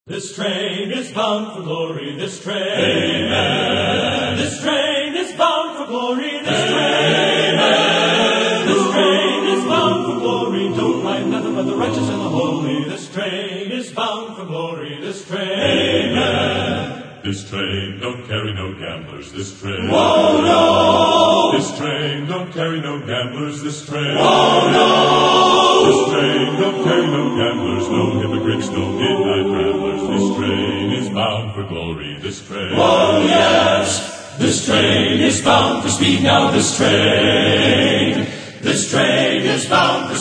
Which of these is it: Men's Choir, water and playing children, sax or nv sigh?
Men's Choir